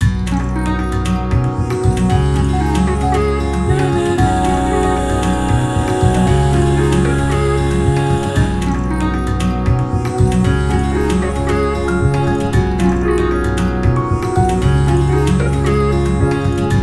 guitarrr

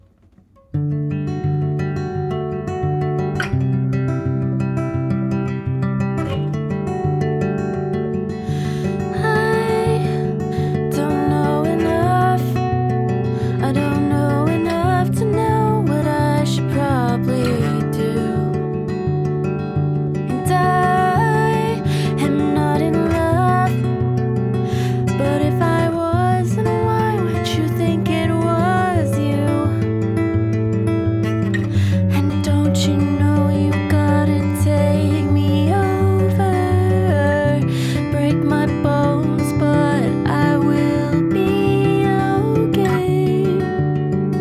acoustic

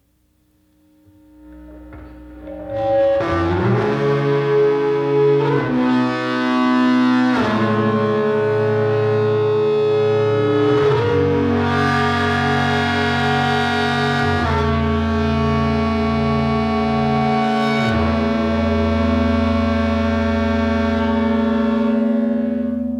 instrumental (version)

